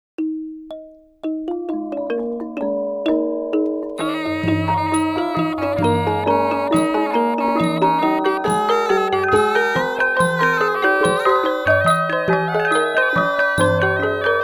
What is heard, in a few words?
full pinpeat